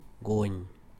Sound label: gunj